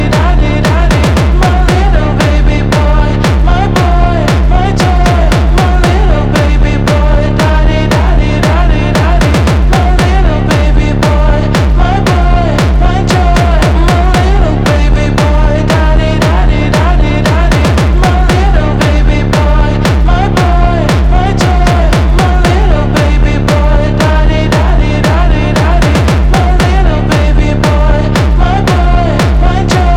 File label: hardstyle slowed